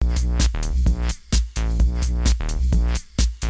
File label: flat beat